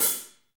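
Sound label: HH, hi-hat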